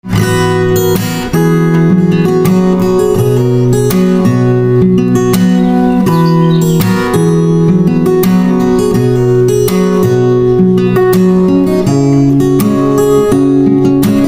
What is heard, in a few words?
Guitar